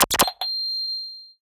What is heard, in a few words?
Steam Inhalator; Plastic Surgery